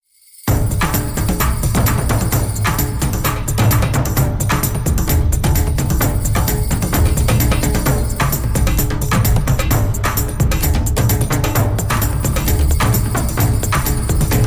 Instrumental Version

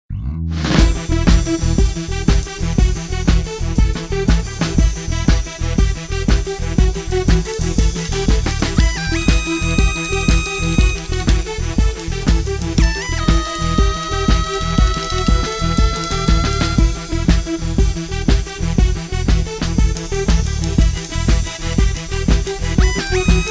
(Instrumental); Johnny Gaddaar

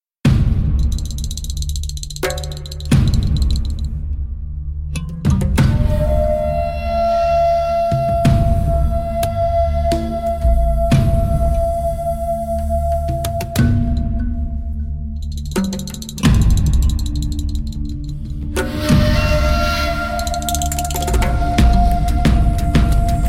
Instrumental Version

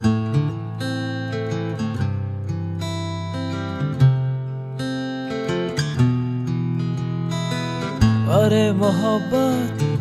(Sad Version)

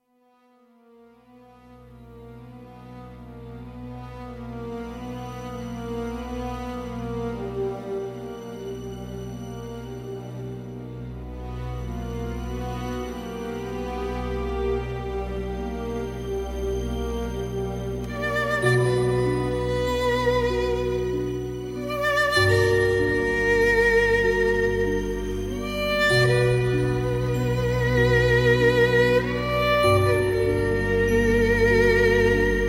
Instrumental; Maqbool

